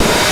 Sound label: OH; NES DPCM; MIDINES